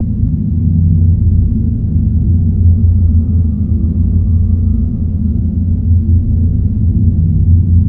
Cave Drone Ambience; ambience nexus tower

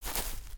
Footstep Grass; creatures pets

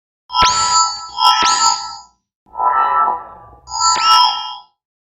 Robot Idle Scan; creatures mechanical; Lego

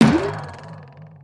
Banana Tree Hit; ambience gnarled forest

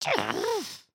indignant, emotes

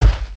Spider Footstep; creatures organic

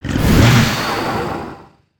scratch

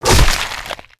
scratch, creatures organic

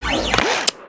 BroomBot servo; creatures mechanical

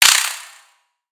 Spider Get Hit; Lego; aronwk collection; ndaudio; creatures mechanical

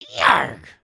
knockdown; creatures organic

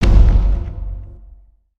Troll Drum Long; ambience

